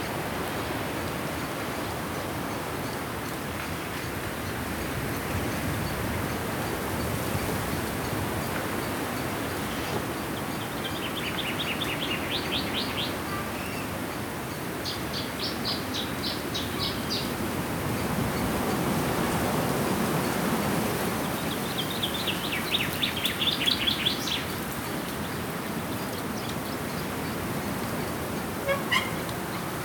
wind trees; firefight

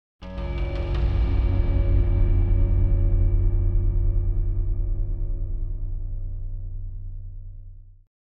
Arpeggiated Broken Chord - Pitched Piano Musical; Hollywood Edge; sound designer's toolkit v2

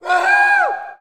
Hockey Male Woohoo; Hockey Vocal Sounds

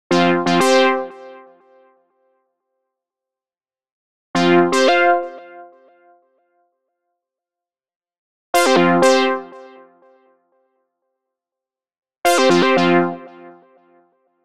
Arcade Jingle Positive; boom library; The Interface

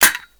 Snap Mousetrap; SFX Kit; Foley - Misc

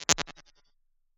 282 Delay, Impulse Responses; Reverbs; PastToFutureReverbs; SST; CLA; IRs

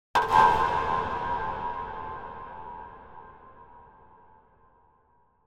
Impact Pointed Wooden Hit - Short; Hollywood Edge; sound designer's toolkit v2

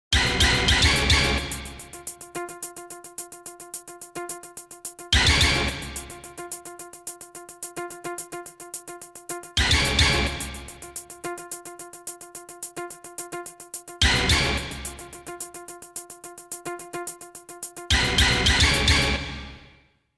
Jingles; 90 DDD Jingles

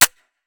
Musket Foley; Sound Ideas; Guns

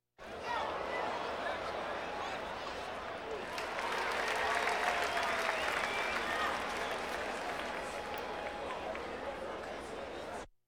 Crowds Race cars Baseball

